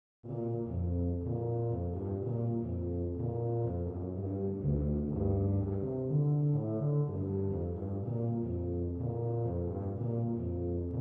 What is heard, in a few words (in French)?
Sousaphone; Les Mysterieurses Cites d'or